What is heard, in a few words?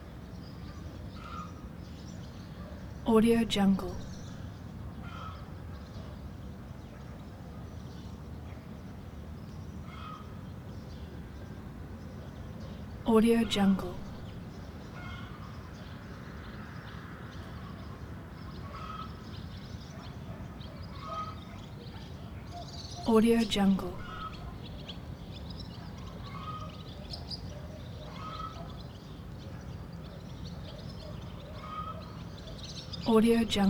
ambience small town rooftop birds dogs roosters